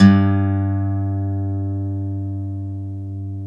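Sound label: GTR G2 AC M; Synclavier Sampler Library; KEYS AND GUITARS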